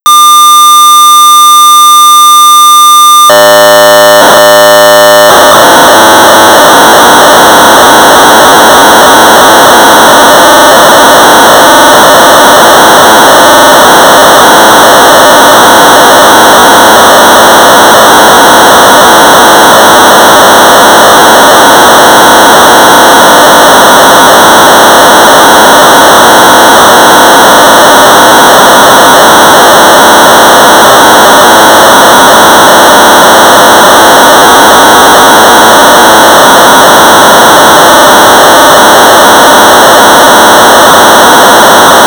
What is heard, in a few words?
Sorcerer 1200 baud; Math; J.R.Lucus; BASIC